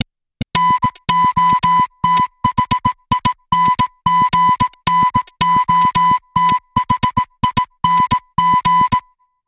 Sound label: transmission, alarms; Nothing OS 1.5